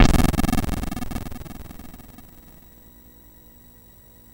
C64 Filter Explosion; 8-Bit; Chiptune; Commodore 64; FX

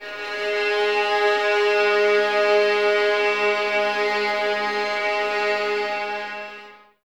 VS G#3, String Ensembles, 11 Violins, Sul Ponte; Miroslav Vitous

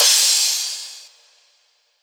Crash, Drums Cymbal

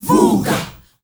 VOO GAHH L, GRP CHANT; Spectrasonics; Heart of Africa 1

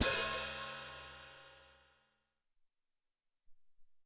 Open Foot, Alesis SR-18

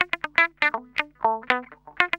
GUI, LICKS; 120 LICKS

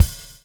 bubble; Spectrasonics - Backbeat

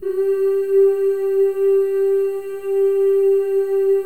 FEM, Symphony of Voices; Spectrasonics

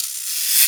Guiro Long, EMU Orbit-3 drum machine; 300 Drum Machines

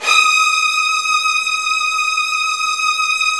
Virtuoso Strings

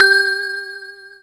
bell swing; Greytsounds; Scott Peers Sound Engineering

